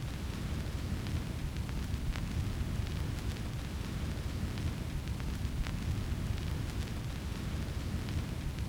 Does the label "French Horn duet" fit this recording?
No